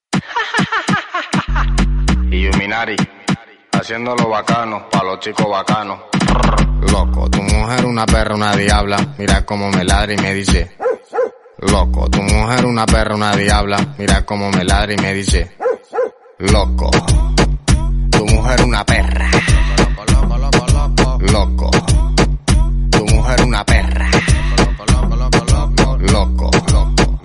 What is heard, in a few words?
background music